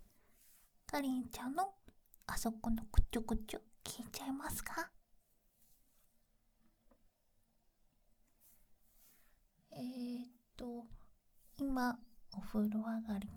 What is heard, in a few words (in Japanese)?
くちゅくちゅオナニー